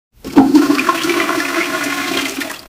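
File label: the sound of flushing water, bathroom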